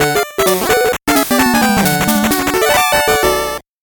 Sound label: 8bit jingle normal